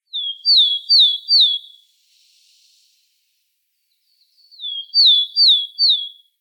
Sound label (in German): Weidenmeise Parus montanus; Vogelstimmen